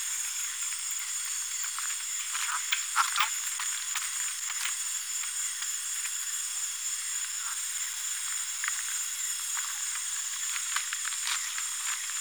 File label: Cheiromeles torquatus